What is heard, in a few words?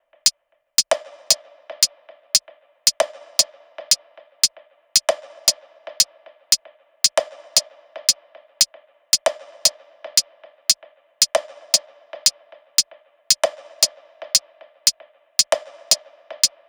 tops 115, Drum Sample Pack Loops Top Loops; SD SEISMIC; Slate